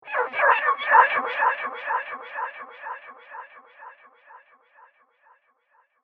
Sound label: Twisted Theremin; Miscellaneous Cartoon Sound Effects; unusual